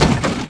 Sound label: bouncy plastic metal chair; bouncy objects; Tony Hawk's Project 8; XBOX